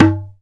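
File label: Ethnic Perc, drum; Percussion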